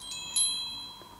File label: Wind Chimes Ringtone; Xiaomi